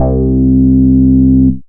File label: Moog Bass; Big Fish Audio; Old Skool House; OSH Bass